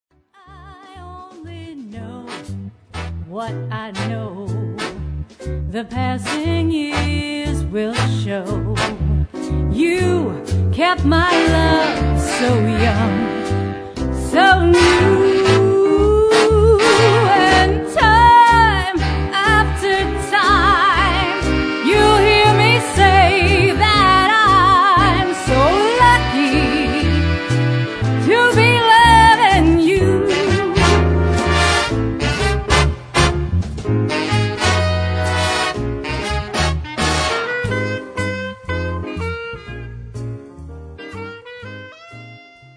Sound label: Wedding Band; New Orleans